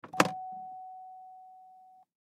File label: Pontiac Grand Am 2003 Door Open Hard Ajar Buzz; Transportation Automobile; Car